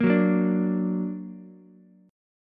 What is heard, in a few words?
D Augmented, D, down, Ukulele; D+ strummed; JGuitar